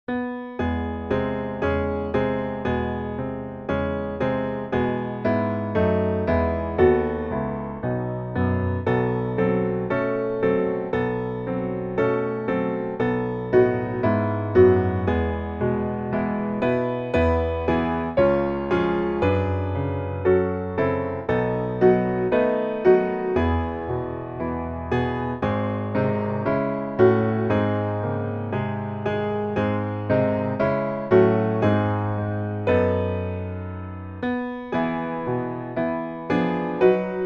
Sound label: piano